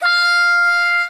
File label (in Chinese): 喔 F5; 音MAD; 孤独摇滚; 虹夏